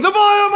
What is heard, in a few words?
car horn